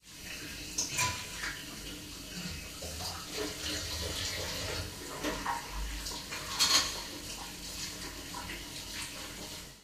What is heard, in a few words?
kitchen, bar ambient